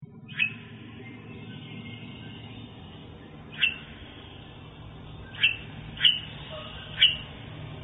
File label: chirp, owl